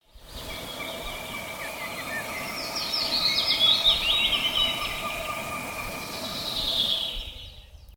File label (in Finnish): kanahaukka; Kanahaukan ”kotkotus; aamutuimaan Repoveden kansallispuistossa Saarijärven rannalla; linnut